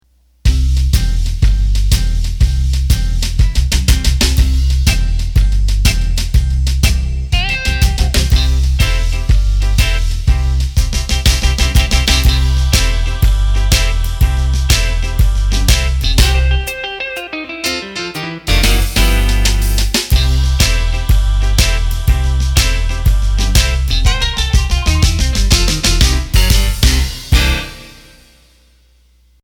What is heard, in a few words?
Slow Rock; roland bk7m